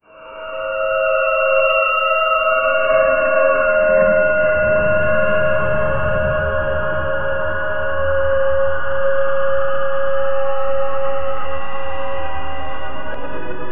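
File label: a slow dissonant dronelike; uncertainty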